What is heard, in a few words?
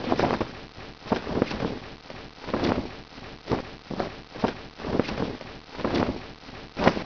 flapping flag; firearms; crash